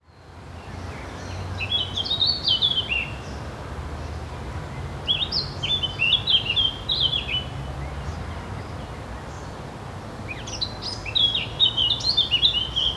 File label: Singing Birds in the City Park; افکت صدا حیوانات; Animals